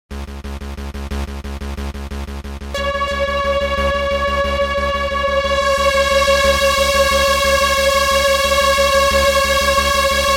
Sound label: Super Saw Slow Trance ARP Sequenz; Juno DS88